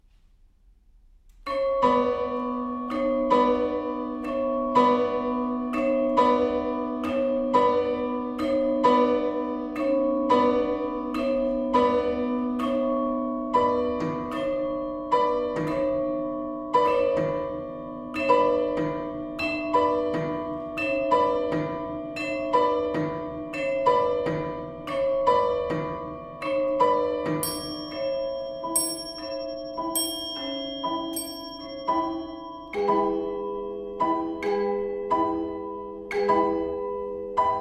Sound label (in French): Cloches